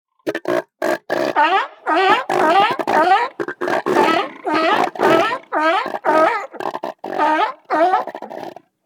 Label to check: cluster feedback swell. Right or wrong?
Wrong